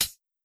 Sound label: closed hat